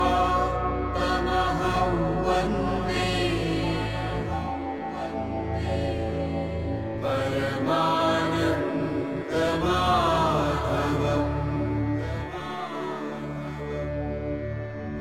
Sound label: Krishna Shloka; Mookam Karoti Vachalam